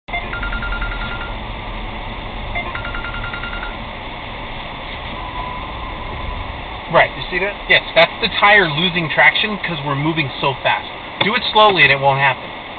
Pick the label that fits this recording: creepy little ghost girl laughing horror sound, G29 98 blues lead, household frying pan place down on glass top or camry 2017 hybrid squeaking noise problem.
camry 2017 hybrid squeaking noise problem